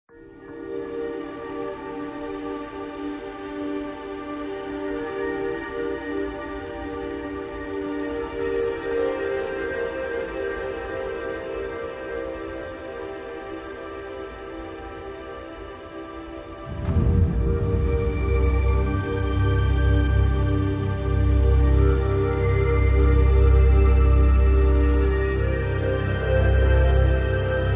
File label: No Choir